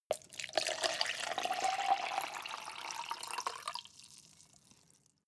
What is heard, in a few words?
cup plastic pour